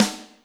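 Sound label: Snare Wet Piccolo, Alesis D4 drum machine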